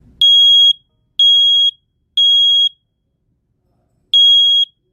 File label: Fire alarm; آژیر اعلام حریق